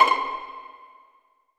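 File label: C 5 pizzicato, strings; AKAI S-Series CD-ROM Sound Library VOL-8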